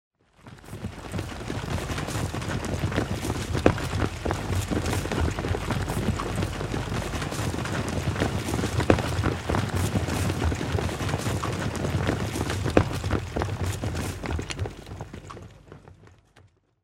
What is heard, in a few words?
Troops; Age of Empires III; cinematics